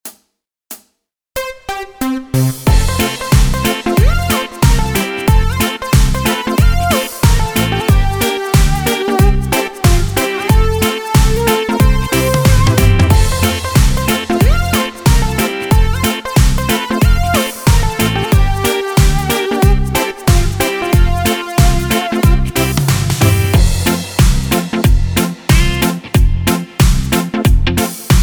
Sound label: Midi Yamaha; yamaha tyros 4